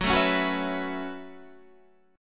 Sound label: Gb Minor 11th Gb down Guitar Standard; Gbm11; strummed; JGuitar